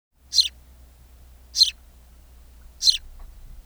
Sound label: start chime